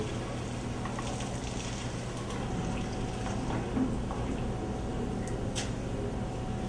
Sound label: noisy water background; Sound FX Real Life